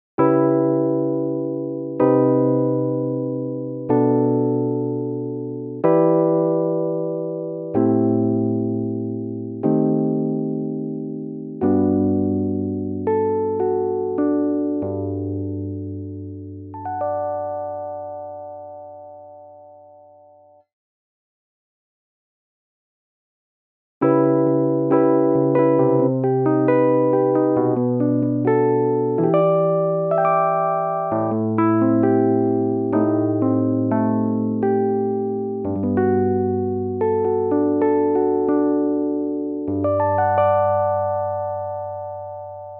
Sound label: Cm7-5 Sample